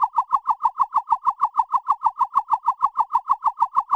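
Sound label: Chirp DX100 D#5; birds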